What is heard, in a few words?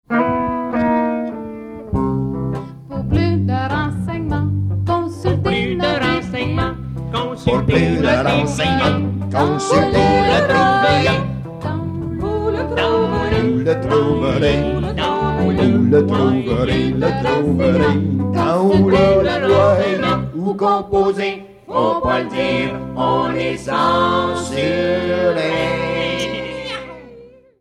jingle western